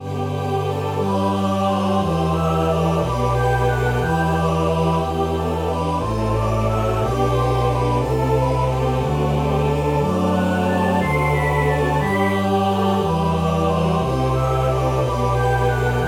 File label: echo drops